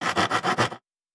Saw Wood; Fantasy Sounds Bundle; Craft

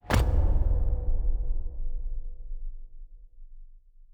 Special Click; Fantasy Sounds Bundle

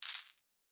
Coins; Fantasy Sounds Bundle

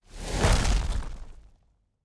Nature Spell, Magic Sounds; Fantasy Sounds Bundle

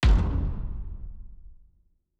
Special Click, Fantasy Interface; Heroes of Hiis; Fantasy Sounds Bundle

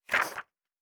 Book Page; Fantasy Sounds Bundle

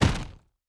Staff Hit; Fantasy Sounds Bundle; Medieval Combat Sounds

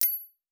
Jewel UI; Fantasy Interface; Fantasy Sounds Bundle

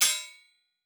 Blacksmith; Fantasy Sounds Bundle; Craft

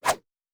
Whoosh; Fantasy Sounds Bundle

